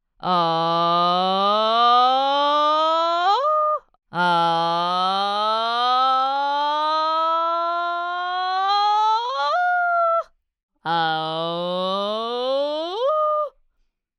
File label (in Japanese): uragoe